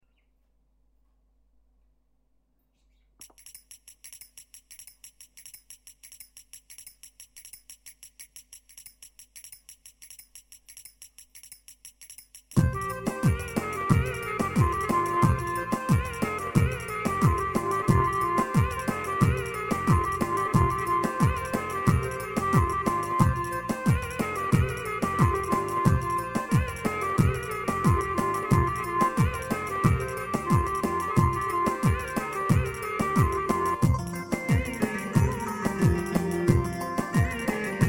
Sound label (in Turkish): oyun havasi, pa 500